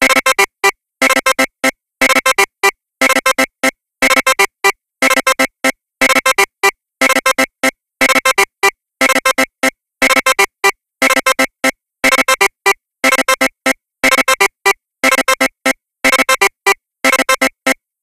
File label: Insert Coin